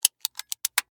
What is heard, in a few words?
Steampunk Device, Scifi, Steampunk, Device, Clicks, Small; BlastWave